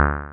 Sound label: Boink